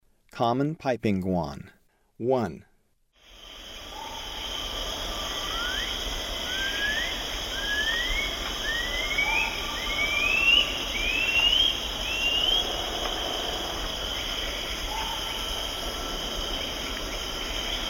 Common Piping Guan, Birds of Ecuador; S. America

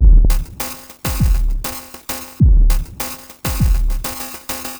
Pulse Insect Beat, Electronica Composite Beats; Sound Effect Library

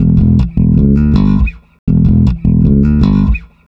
SWEET & LOW BASS

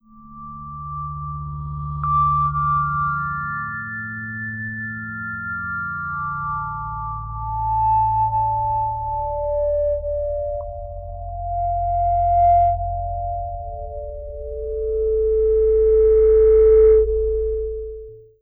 SLOW SILHOUETTE

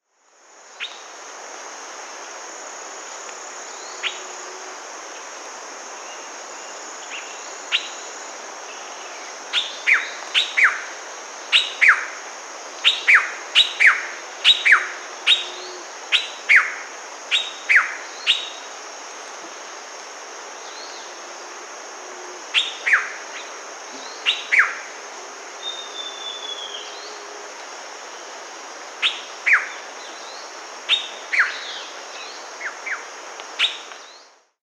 Selva Cacique, Cacicus koepckeae, S. America

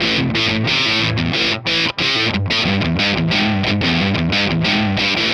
Hard Rock Guitar; Guitars

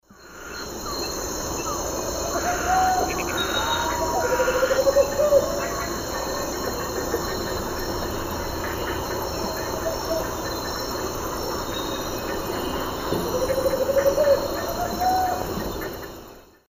Megascops choliba, songs 2 birds; S. America; Eastern Lowlands

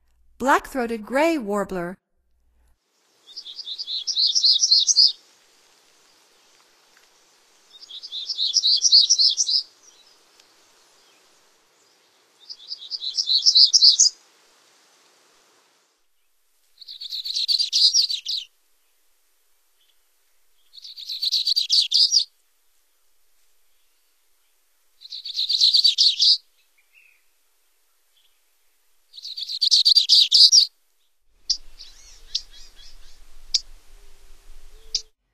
Black throated Gray Warbler; North America; Stokes

